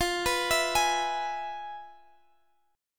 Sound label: F Minor Major 7th Flat 5th, F; FmM7b5; arpeggiated; x,20,21,21,21,x